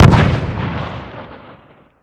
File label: explosion 40mm distant impact; Explosions